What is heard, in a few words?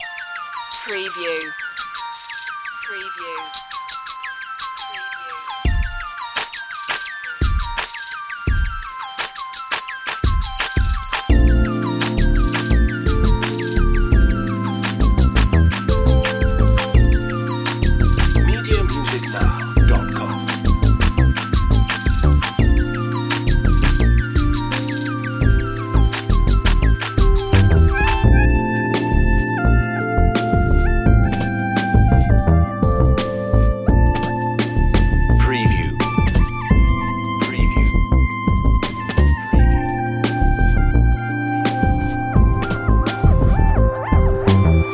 trap miller